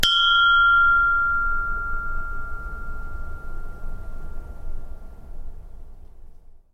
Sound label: chinese bronze bell side; sackler